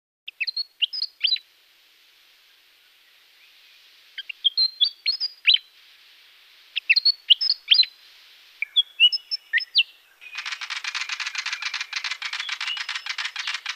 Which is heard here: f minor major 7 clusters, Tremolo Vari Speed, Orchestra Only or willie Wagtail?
willie Wagtail